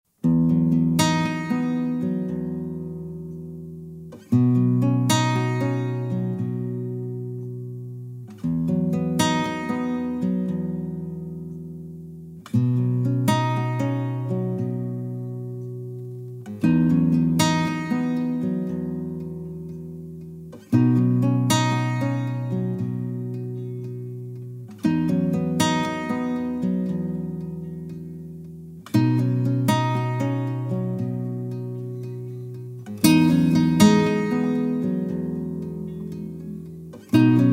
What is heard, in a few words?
Peaceful Guitar